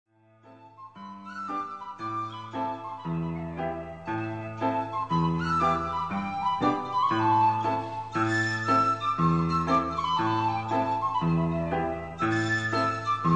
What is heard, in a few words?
road; mallaig